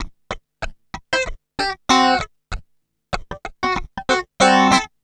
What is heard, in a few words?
FUNK, FUNKY GTR